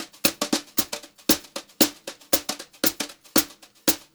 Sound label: Brushed Drum Loops, JAZZY